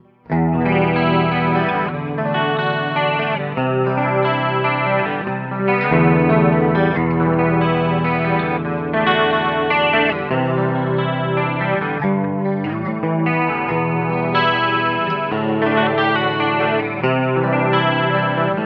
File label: Clean